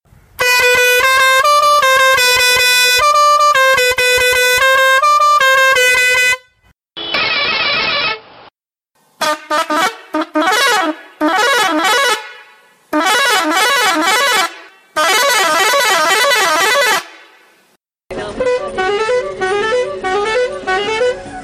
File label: Air Horn